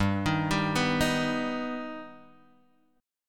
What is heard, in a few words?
G Augmented, Guitar, Drop D; 5,6,5,4,4,x; down